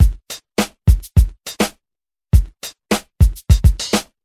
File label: 103 bpm drum loop sample g key